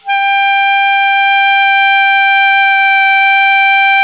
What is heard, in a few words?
ALTO PP G#4, saxophones; Greytsounds - Philip Wolfe David Crozier's Saxophones; ALTO SWITCH